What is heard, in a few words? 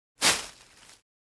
Pile of leaves rake impact hit; garden